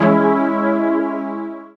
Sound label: SYN PAD, dance synth; Sound & Vision - Gigapack I CD 2 (Roland)